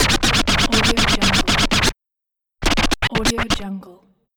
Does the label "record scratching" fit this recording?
Yes